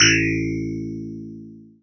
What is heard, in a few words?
technosyn2, Techno Trance; Ueberschall; SYNTH